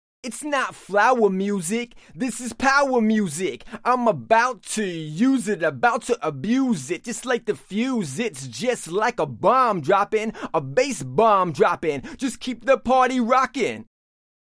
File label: 140 Am; HH; acapella